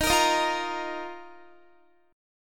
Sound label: Eb Suspended 2nd, Guitar; Ebsus2; strummed